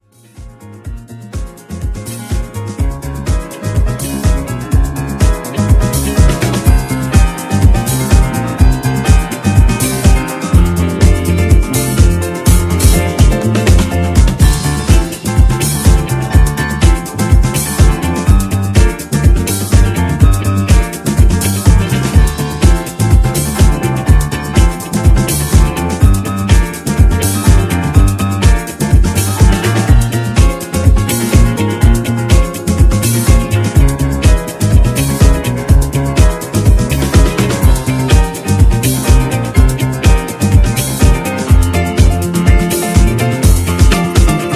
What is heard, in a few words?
Break